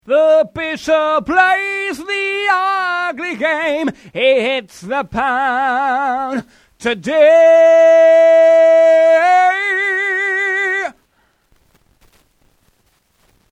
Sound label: Dry Signal